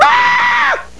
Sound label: scream; homer; simpsons